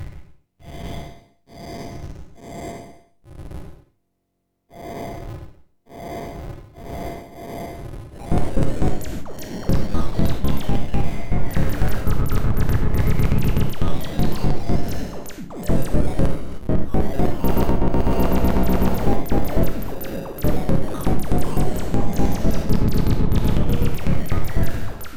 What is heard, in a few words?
beats; zorlon cannon; modular demos